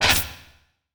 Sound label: Transition Blast Short Hit; Production Element Title Transition